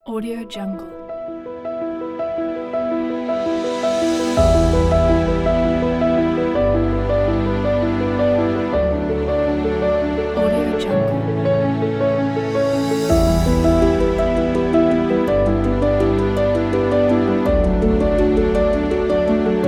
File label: epic